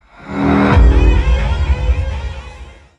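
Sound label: scary sound; strashnij zvuk iz ono 2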